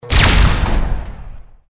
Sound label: laser fire; liberty prime